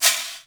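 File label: maracas, ethnic drums; Crypton - DrumBase5000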